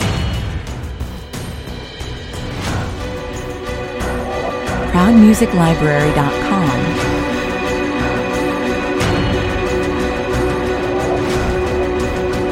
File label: perc and synth